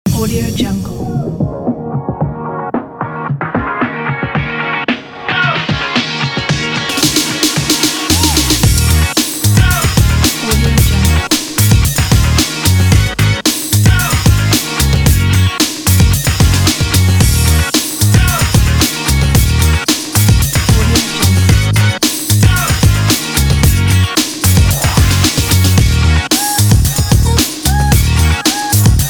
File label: uplifting breakbeat